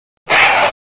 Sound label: stukabat die; hl1 npc